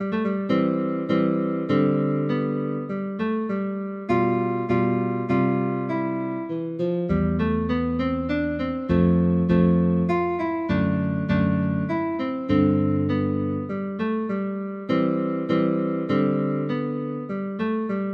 allegretto, guitar; giuliani

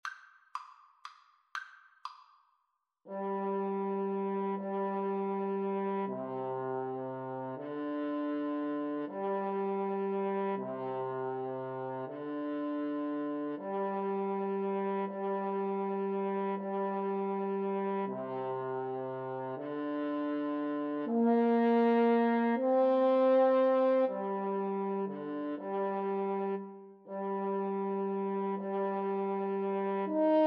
French Horn Duet

